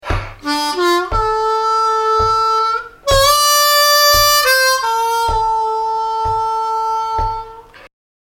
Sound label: Lick, harmonica